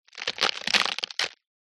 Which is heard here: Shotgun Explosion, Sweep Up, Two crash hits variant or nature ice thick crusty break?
nature ice thick crusty break